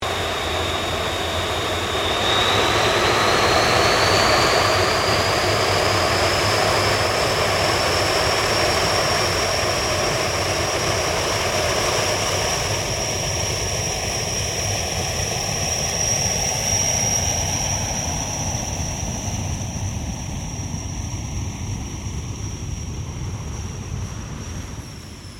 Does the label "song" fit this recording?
No